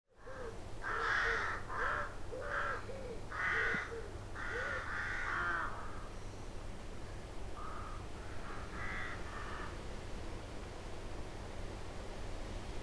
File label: durdle door rookery